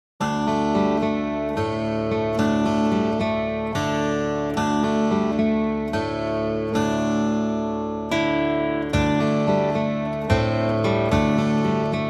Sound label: No Harmonics